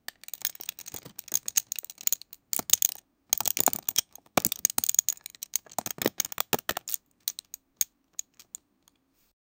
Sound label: satisfying sound of piece, assemble